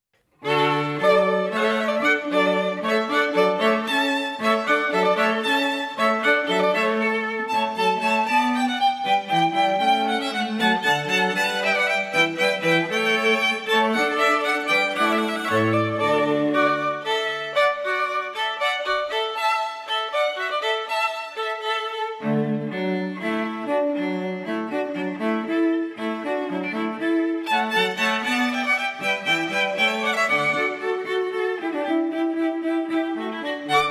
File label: String Quartet; Aaron; Handel